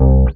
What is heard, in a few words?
Single Acoustic Basses